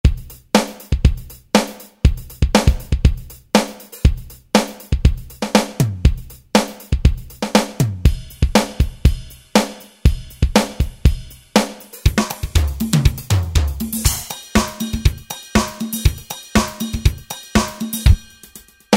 Fuse, Groove in 6, Smooth Jazz, Heavy Funk, Tech Fusion patterns, Boss DR-770; Preset Patterns